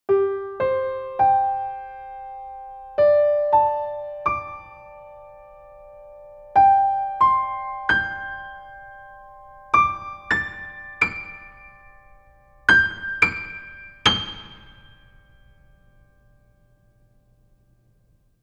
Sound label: comparison Ivory Bosendorfer hi note